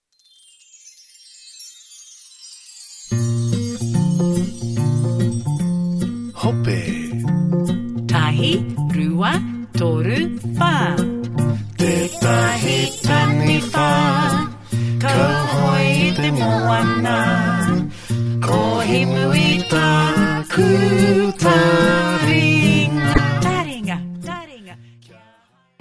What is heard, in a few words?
waiata maori